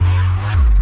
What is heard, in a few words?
two note growly synth bass; Basses